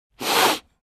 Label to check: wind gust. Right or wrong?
Wrong